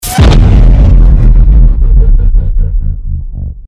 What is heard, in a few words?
gravity warp; tf2; freak fortress 2; lunar; moon